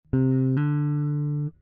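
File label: Hammer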